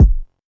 round kickdrum sample f sharp key, Royality free bass drum single hit tuned to the F#